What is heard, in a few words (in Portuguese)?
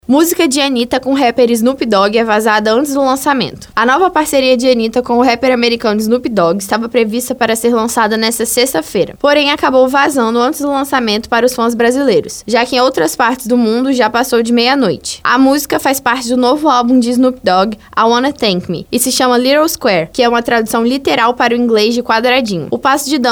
MÚSICA